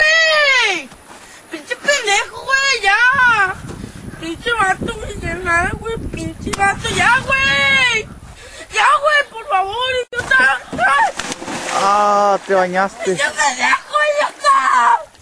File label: mexican ringtone